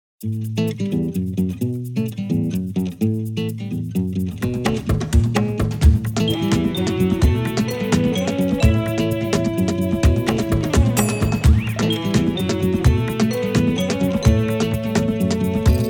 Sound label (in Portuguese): CANTO DE TOCAR